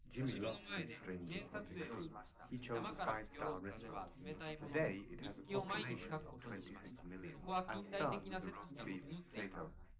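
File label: male, live recording 250ms 5cm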